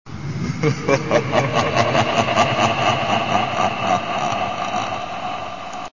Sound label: laugh